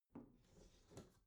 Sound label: bathroom cabinet open soft smooth; objekte; tueren